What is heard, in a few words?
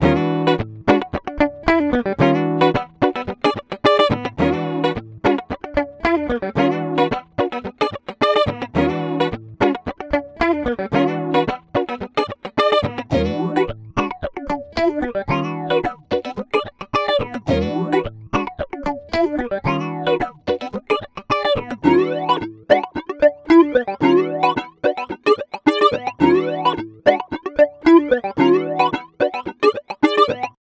Electric Guitar; Flow